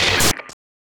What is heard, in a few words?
Glitch Sound